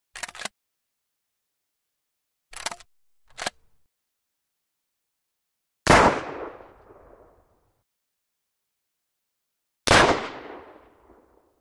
Звуки выстрелов в тире из пистолета ТТ

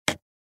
Звук включение рубильника

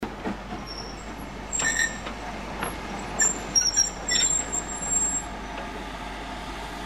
Паровоз тормазит

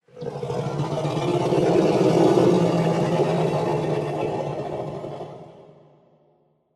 рычание офтальмозавра

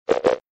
Звук ошибочка

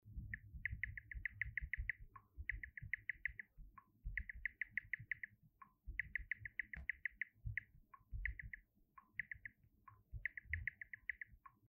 Звук набирает текст на android

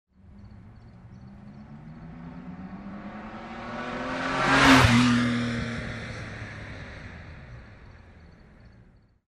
Быстрый проезд спортивного автомобиля Fast travel of a sports car

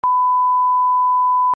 Запикивание мата, The sound of censorship

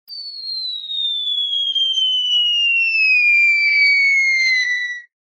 Звук медленного падения из мультика

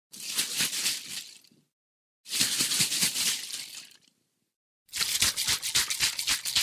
звук встряхивания баллончика с лаком для волос

